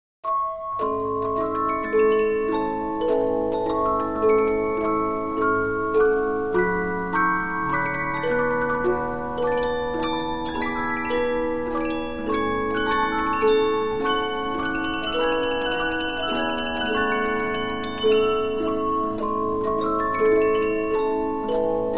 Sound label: Polyphon, 15inch disc musicbox